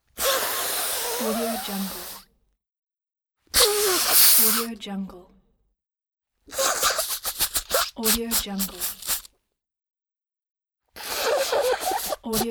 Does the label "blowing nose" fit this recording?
Yes